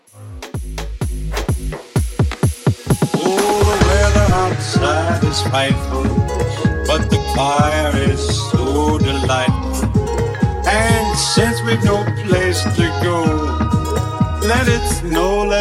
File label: Christmas Afro Bootleg 127